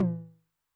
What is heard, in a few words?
HI TOM, High Tune Long Decay, 909; classic machines